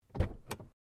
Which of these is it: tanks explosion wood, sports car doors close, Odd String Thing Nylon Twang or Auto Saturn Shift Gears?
sports car doors close